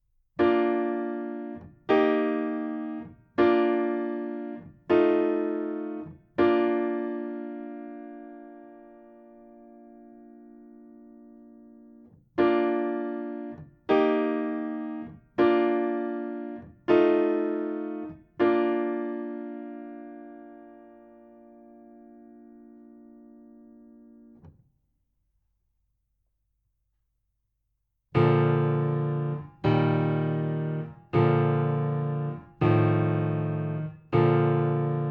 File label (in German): Kadenzen in B Dur Übung